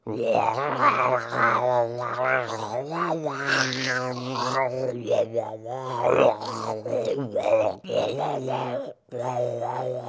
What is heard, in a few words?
sing; SF sing 03